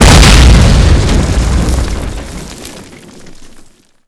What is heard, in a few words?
Mortar dirt; modern warfare 2